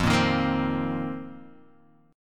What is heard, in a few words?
F 7th Suspended 4th F down Guitar Open F; strummed; JGuitar